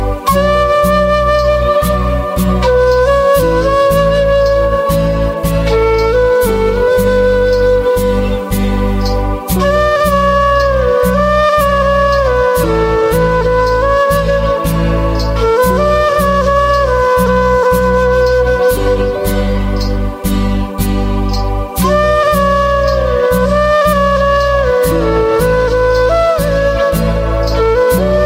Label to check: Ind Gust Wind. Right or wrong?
Wrong